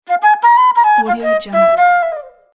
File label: jazz flute